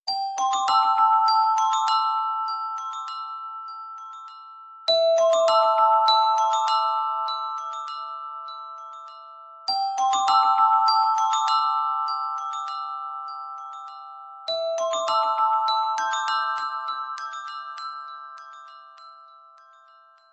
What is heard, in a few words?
IPHONE 5 NOTIFICATION TONE